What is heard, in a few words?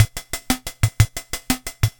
Yamaha PSR-22 Slow Rock